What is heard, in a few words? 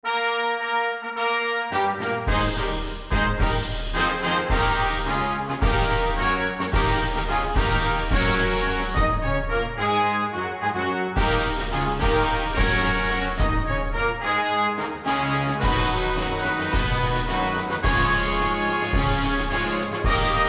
ceremonial fanfare